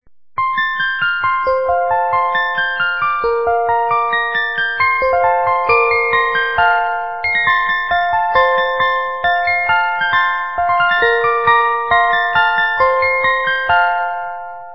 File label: JINGLE BELLS